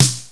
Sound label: SD Room L; GMPlay; Drums; XG